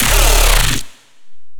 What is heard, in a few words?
Bass Manual Growl D3